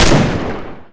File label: sherman mg; weapons